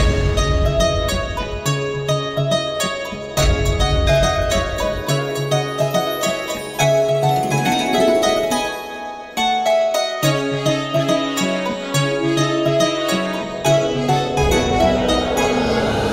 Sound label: Music